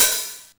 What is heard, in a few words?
909 HHO, TR-909